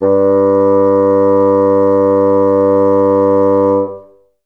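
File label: bassoons sustain G1; Best Service; Peter Siedlaczek's Advanced Orchestra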